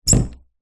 door cupboard close fastener squeak

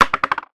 plastic biscuit jar lid drop on table

